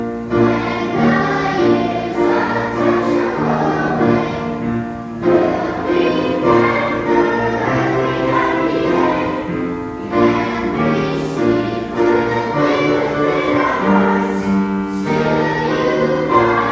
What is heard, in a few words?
song verse